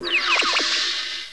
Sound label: transition; Professor Fernleaf from the Tropics